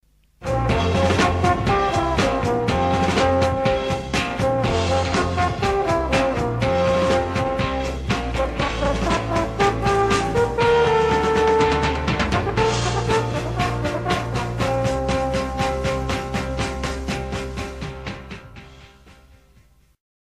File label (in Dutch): De hoorn in popmuziek